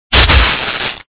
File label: shock